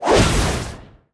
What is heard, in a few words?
Impact punch